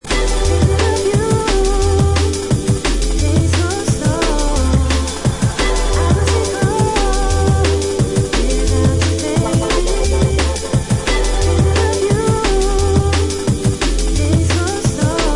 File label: Drum Bass